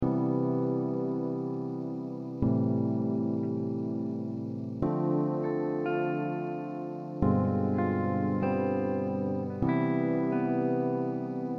C 100 Jazz Chords GTR Solo

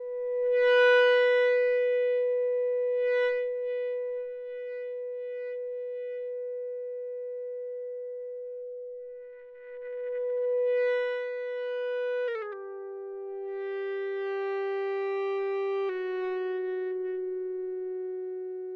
Em70 Ebow dry

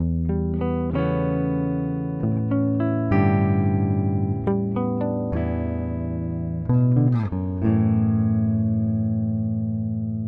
A108 GT Riff dry

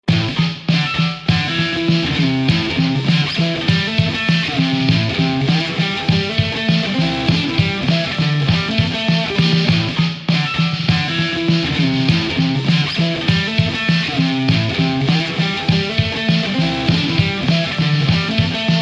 A100 Rocky Riff

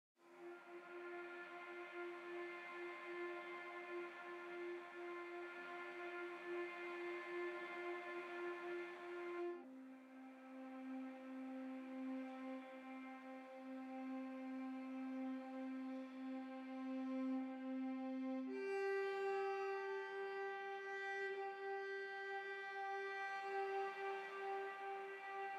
Cello Harmonics Sus

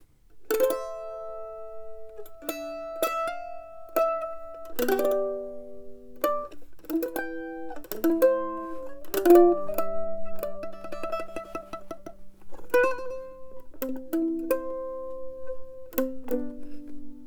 Sarabande Mandolin dry; Reusner

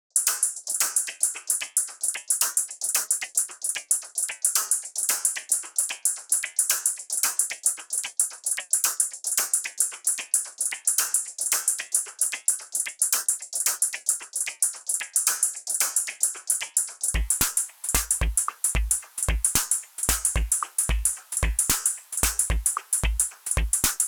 Inequality Groove

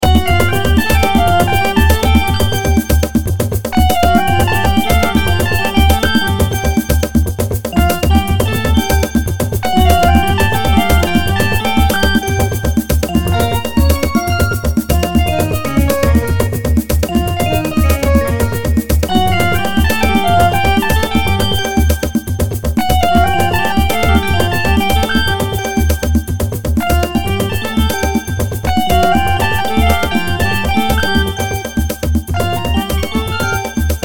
Creamy Sax Bass Bells

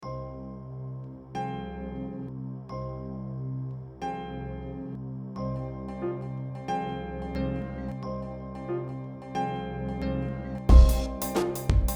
Cm90 Big Bloo Groove

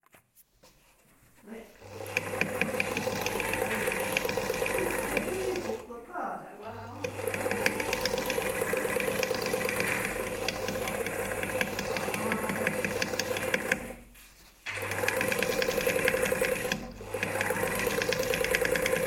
the singer sowing machine

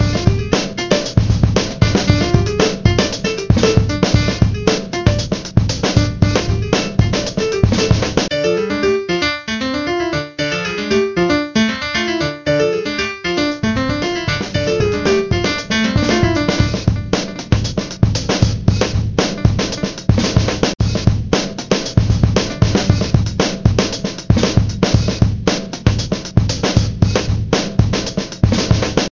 Short Fiction Beat

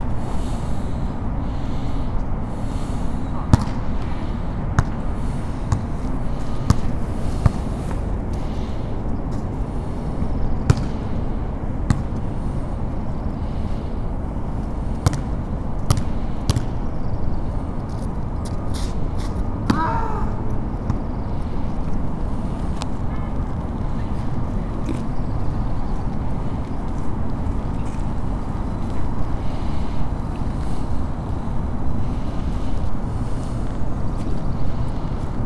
b ball; Coruscate

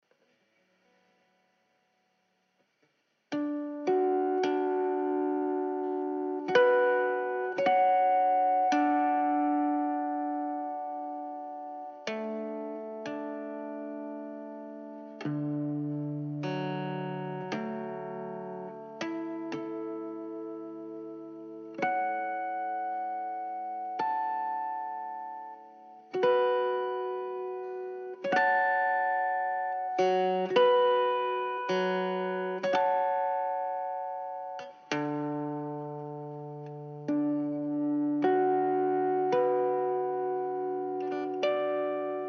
D110 GTR Impro

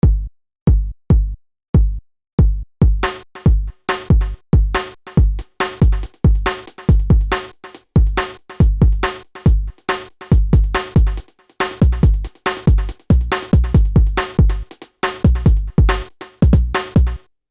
Dreams Drums

